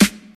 airy rap acoustic snare sample g sharp, Royality free acoustic snare sound tuned to the G# note